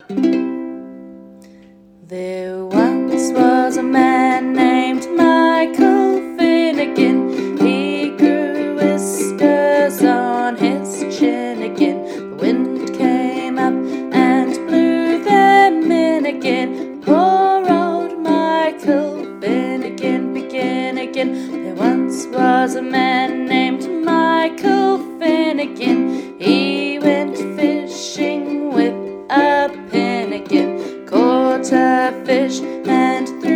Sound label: Uke; Michael finnegan; Uke book